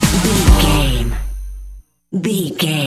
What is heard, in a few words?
club journey sting, Dance, EDM, Club, Eurodance; Exercise; Aerobic; Aerobics; Spinning; Workout; Energetic